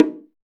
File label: BGO LO, BONGOS; Drums In Your FACE!